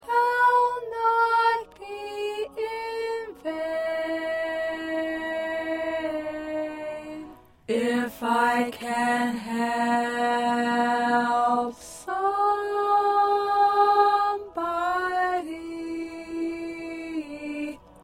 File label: 2nd Alto